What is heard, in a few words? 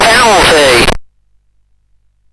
CSG8580R5, GAMES; Slapshot